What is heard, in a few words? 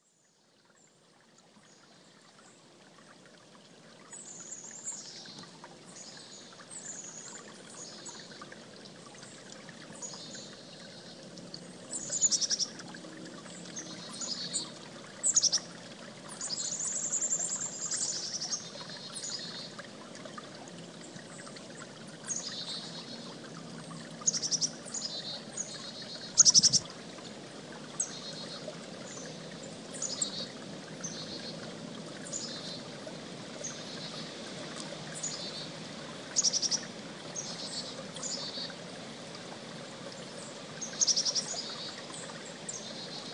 creek and chickadee